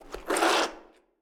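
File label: Zombie Footsteps; Blind Survivor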